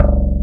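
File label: bass guitar E2, jazz bass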